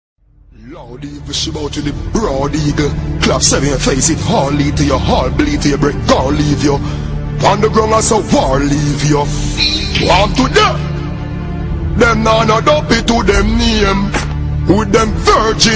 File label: nah laugh